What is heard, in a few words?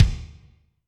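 Kick Slam Room, Alesis D4; Kik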